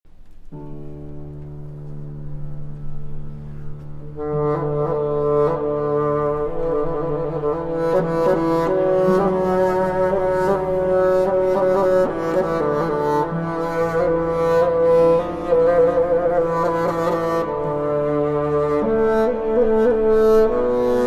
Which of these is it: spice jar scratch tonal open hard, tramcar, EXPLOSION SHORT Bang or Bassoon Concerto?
Bassoon Concerto